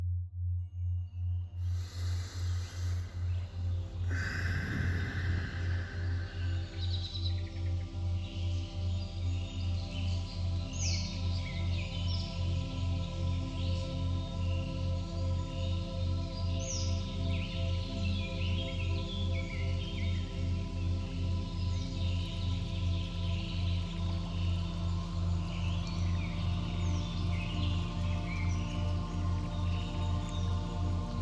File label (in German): Delta BB 2.5Hz mit Ambient Sound; Regeneration; binaural